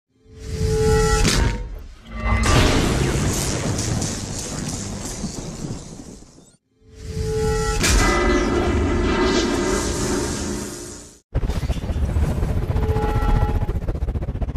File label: Hammer; Hammer of Thor; چکش ثور